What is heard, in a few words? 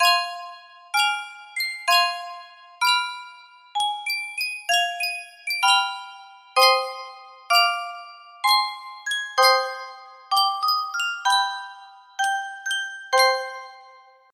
Sankyo music box